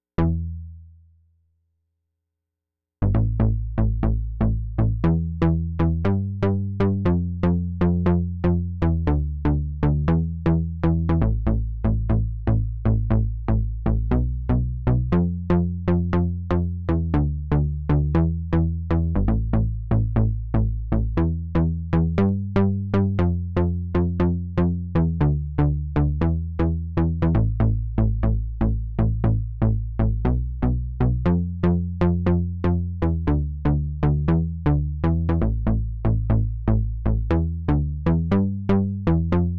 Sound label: Sub37 fancy bass